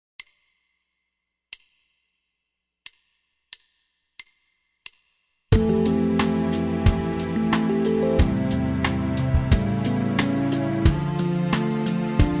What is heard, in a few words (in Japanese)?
フレットレス melody; フレットレスの練習帳